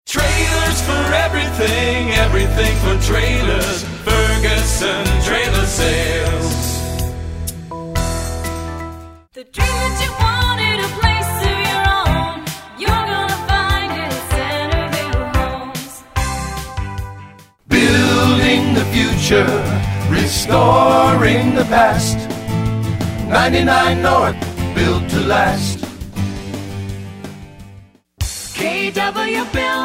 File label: Jingle